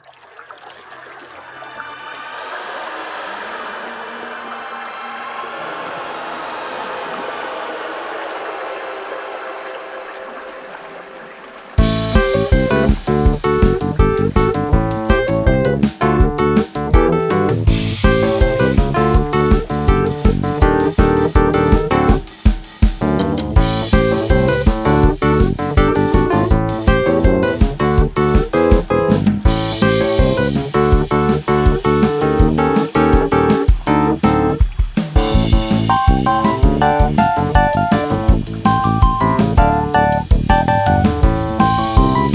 moontime celebration acid funk

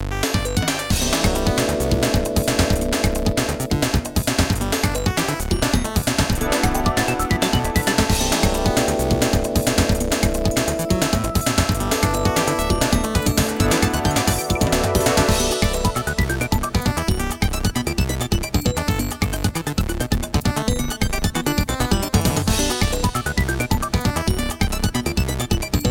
korg m01d; starpeople; weeklybeats-2014

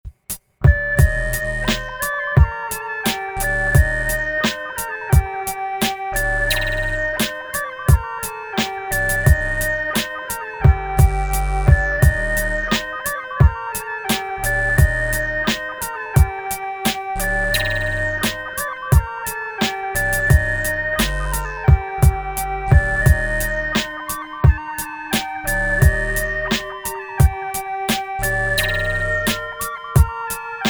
arp beat

